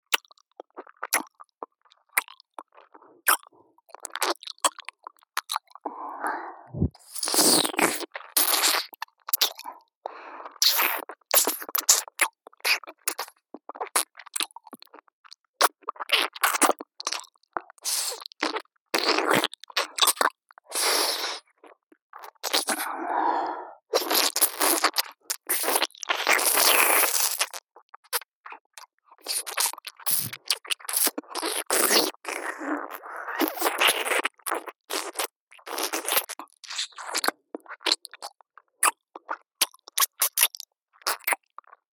妹の右耳舐め, ASMR; ループ

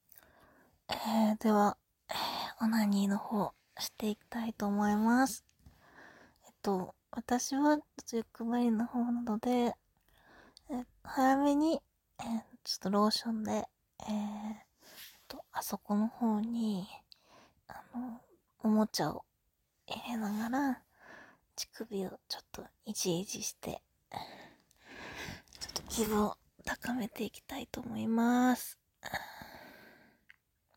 おちんぽむしゃぶり願望オナニー; フェラが大好き！現役ライターによる、おちんぽむしゃぶり願望オナニーSP! 本番

